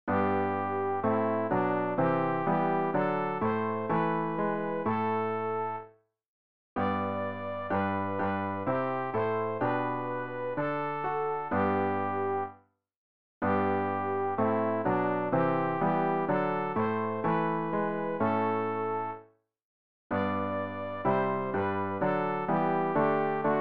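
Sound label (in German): sopran, Übehilfen; Ref. Gesangbuch; RG 041: Jauchzt, alle Völker, preiset alle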